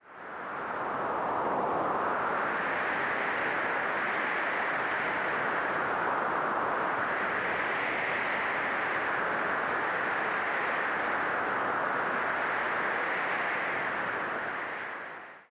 hillside plain

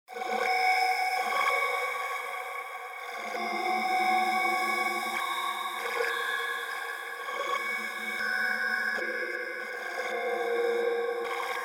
broken promises filter

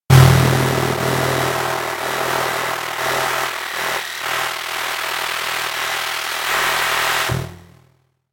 faulty radio

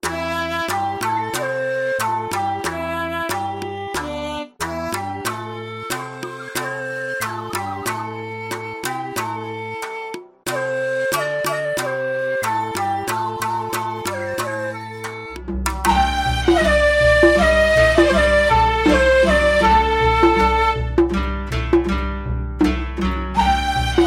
shakuhachi japanese flute

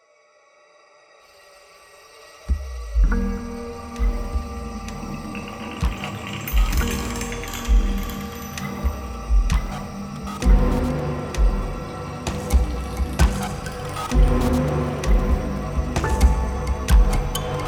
claustrophobic solo

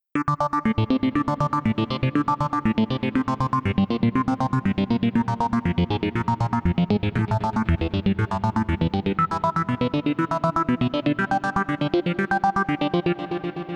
disco from the 80tys

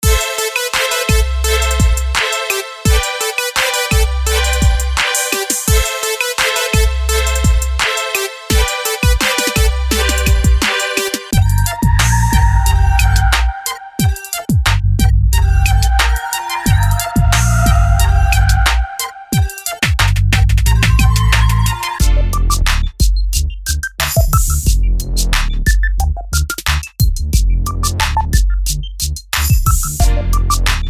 hip hop

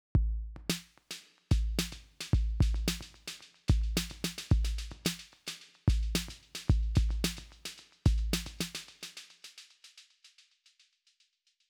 drumloop 2 high groove dotted

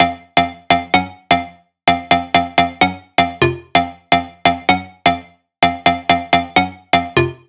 future bass house loop

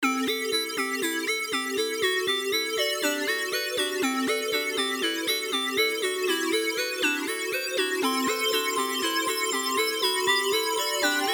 tube tropics

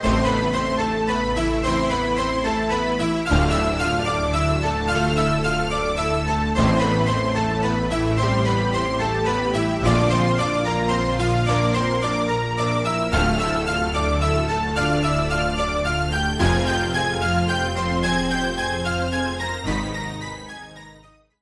keys; sagittarius

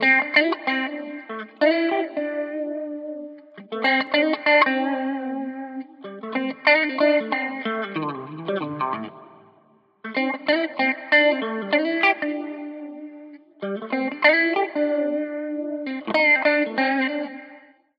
watery phasing guitar wet

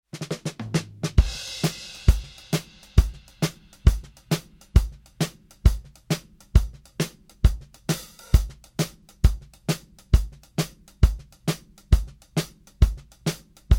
134 70s pop rock

